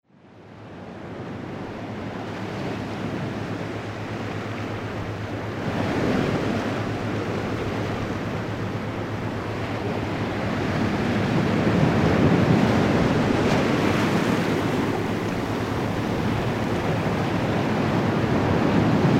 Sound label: Dream Wave Meditation